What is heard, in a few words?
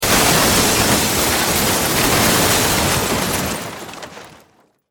Impact Crash Roll Large Object Heavy Short; Crashes